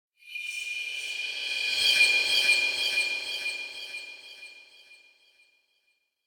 Metallic Echo Screech Transition Tone; Hollywood Edge; sound designer's toolkit v2

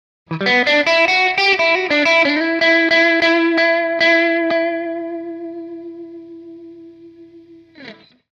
Blues Guitar - Short Solo; Hollywood Edge; sound designer's toolkit v2

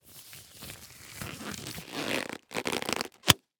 Latex Glove; Blastwave

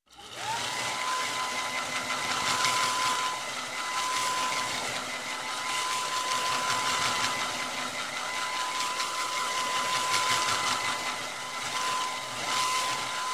Hair, Dryer Drying Hair, Barber Shop, Hair Salo; Hair Salon; Sound Ideas 2000 Series

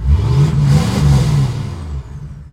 Humvee Rev; Trucks

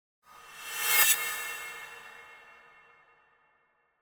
Whoosh Metallic Thin Attention Grabber; Hollywood Edge; sound designer's toolkit v2

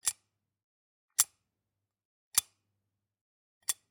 Generic Button Metal Tiny; boom library; The Interface

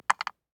DICE on Wooden Tray, Drop, Standard, 4 Four Dice; Samples; ArticulatedSounds; DICE Sfx Party (Extended)

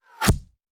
Bullet Impact; Sound Ideas; Guns

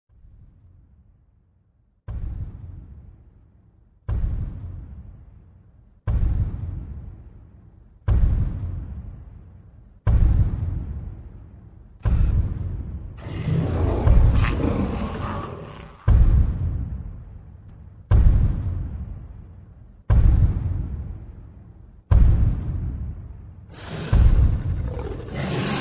distant large monster

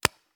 Paintball Gun; Sound Ideas; Guns

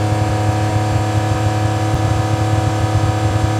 Lamborghini Loop, Automobiles

